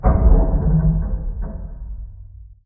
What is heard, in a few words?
structure blunt; Barotrauma; Subsurface; Damage